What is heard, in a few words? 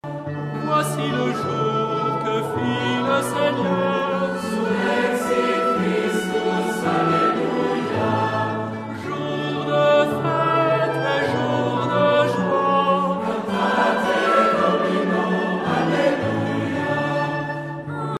surrexit christus solista